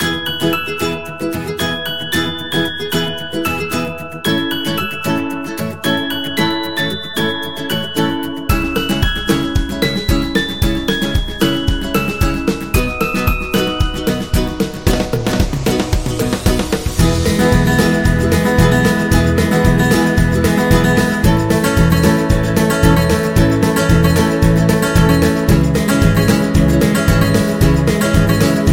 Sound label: Happy Summer Folk, happy music